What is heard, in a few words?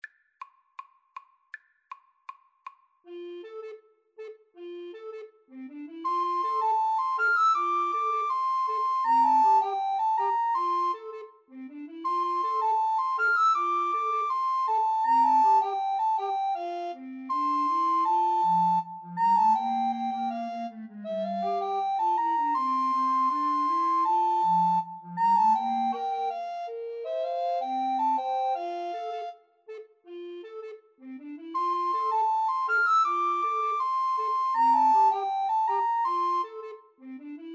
linstead market, Recorder Trio; Band Playalong; Jamaican Trad.; SAB